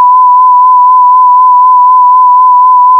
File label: sine freq mod